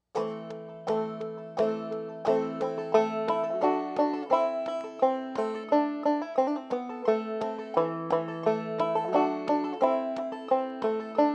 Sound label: fast; Christmas